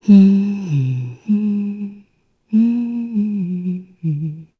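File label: Vox Hum, korean; Glenwys; kingsraid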